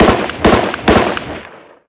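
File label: gun m16 medium; ModernWarfare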